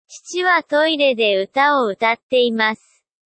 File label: dad singing song toilet